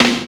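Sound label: GATED snare; East West - Phat & Phunky CD2; 90's Sample CDs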